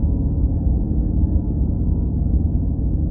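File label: ambience station hall loop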